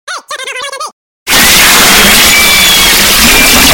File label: pee